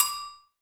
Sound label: AGOGO HI; EdgeSounds; Drum Mashines; R-8 DRUMS